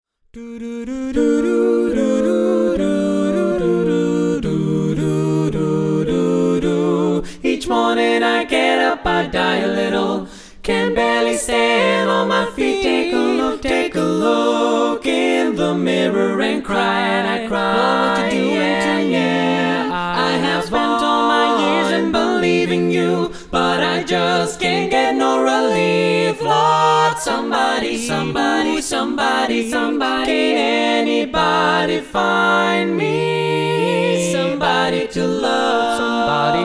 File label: female